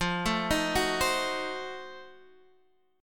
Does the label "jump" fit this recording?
No